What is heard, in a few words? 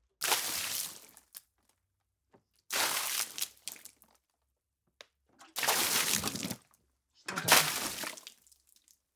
water throw on cement; foley walkers